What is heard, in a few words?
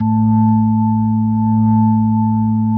A1, B3